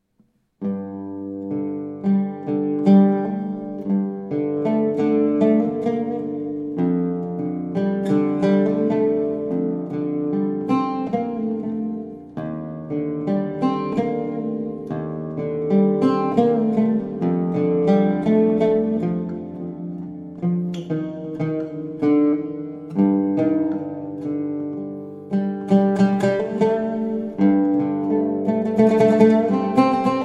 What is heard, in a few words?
Meditative Improvisation